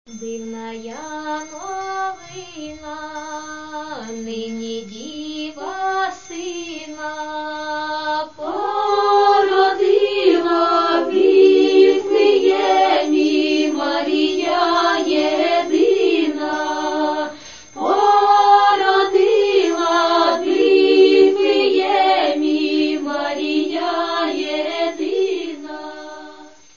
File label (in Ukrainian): koljadky